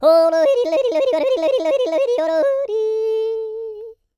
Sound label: YODEL; ILIO; Vocal Planet